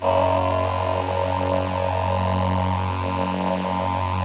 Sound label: VP-330, String Machines